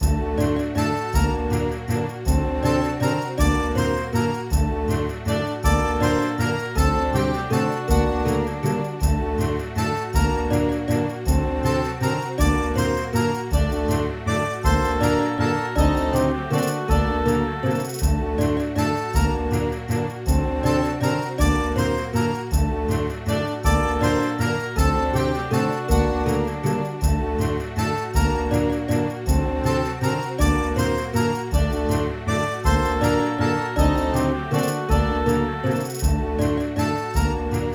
Event Happy; Dungeon Explorer: Warriors of Ancient Arts

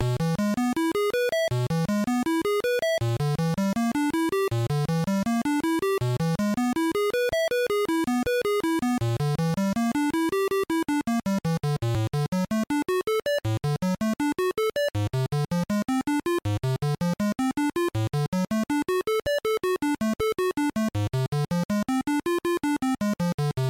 Bonus Game; Barbie Game Girl; GB

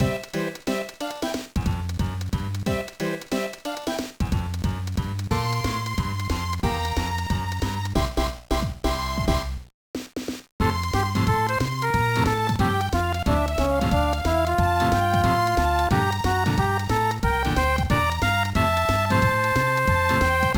BGM; Tokimeki Yume Series 1 Ohanaya-san ni Narou; GBA

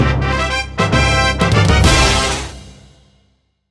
SFX Clear; Pokémon Battle Trozei; Pokémon Link! Battle; Nintendo 3DS

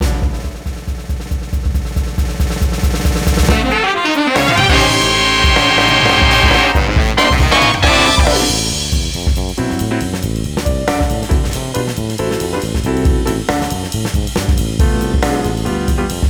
Win A Car; Gran Turismo 4

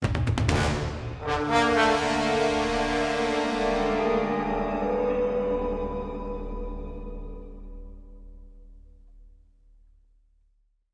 Spooky Endtag; Spooky Ship

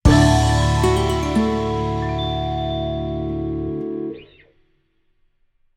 Finish Morning; Everybody 1-2-Switch!

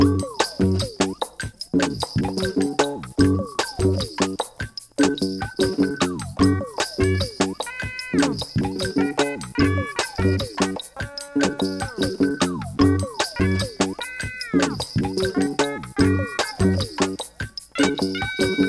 Menu; We Bare Bears Free Fur All; Android

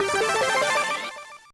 unlock

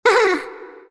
Gingerbread Boy Appear; Cookie Jam

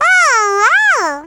China Miss Sound; Jolly Battle; Android

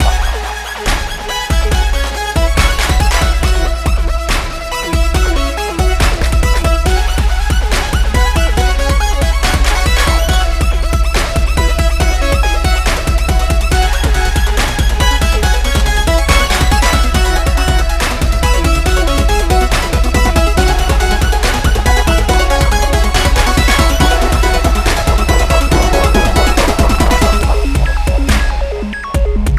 WIN MUZAC LOOP; high hell

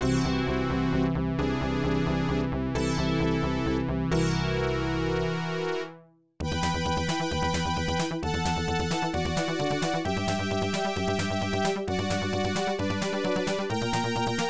Cyclops Attack; battlantis

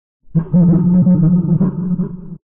laugh; golden freddy; Five Nights at Ronald's

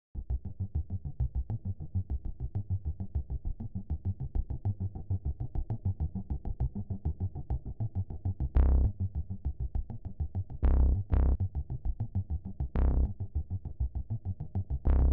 Bass I; risk of rain 2 engineer edition 2

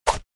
Assassin Attack

